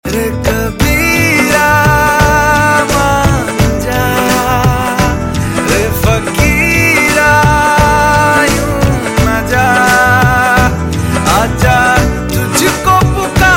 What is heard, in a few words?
Ringtone